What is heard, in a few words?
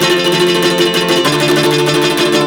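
OCT GUIT MEL, OCT GUIT MELODY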